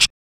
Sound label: Shaker Sounds